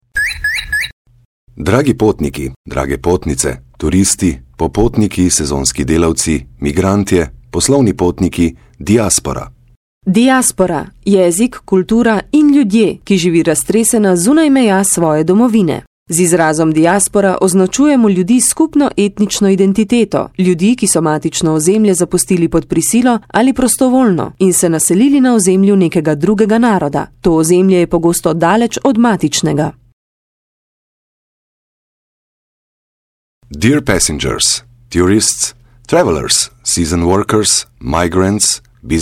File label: jingles; DIASPORA